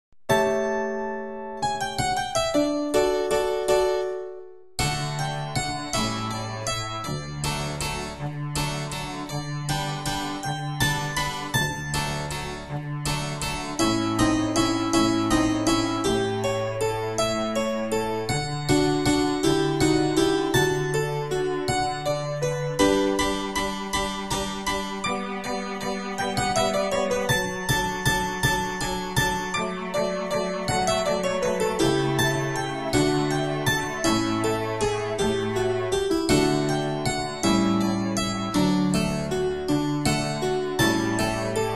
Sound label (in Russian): Колыбельная